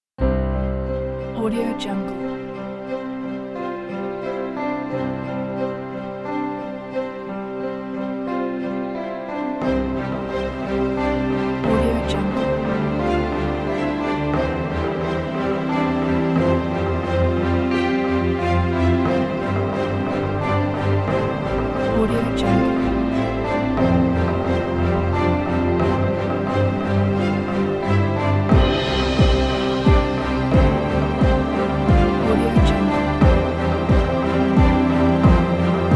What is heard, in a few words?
dramatic inspirational motivational buildup